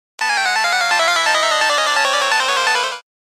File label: pokemon trainer encounter sound effect